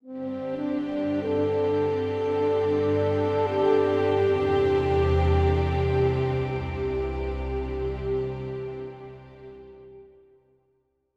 Music Tower Flyover; theHunter: Call of the Wild

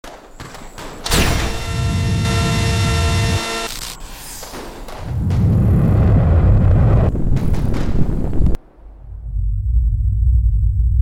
Laser Cut; Infiltrating The Airship; Laser Cut MK1

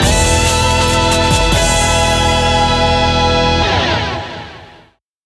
Jingle - Win

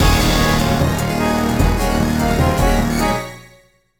BGM STAGE BATTLE; Phantasy Star Zero

